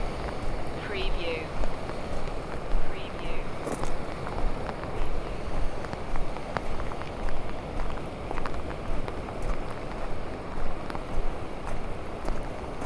Rain on a hood walking, footsteps, pebbles, near the sea loop; beanstalk audio